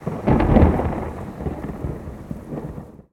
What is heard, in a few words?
thunder; netent; deadoralive